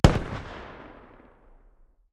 hmg m2 medium distance, 12.7x99mm; tacz-tweak-pack; taczmechanics

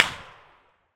far, whizz; tacz-tweak-pack; taczmechanics

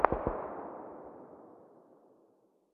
hmg very far distance, 12.7x99mm; tacz-tweak-pack; taczmechanics

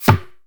wood, hit; tacz-tweak-pack; taczmechanics

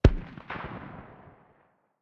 hmg m2 far distance, 12.7x99mm; tacz-tweak-pack; taczmechanics

